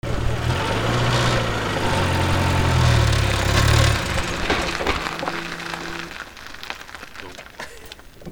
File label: CARS